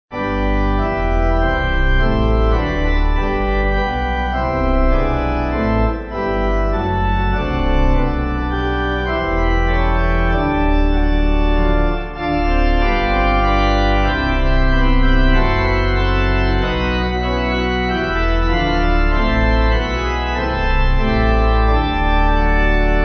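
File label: Pipe Organ, Music Backing; The Eternal Gifts; Gonfalon Royal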